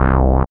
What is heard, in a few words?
SYN 202, Roland MC-202; Sound & Vision - Gigapack I CD 2